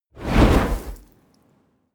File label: whoosh fire ball crackle small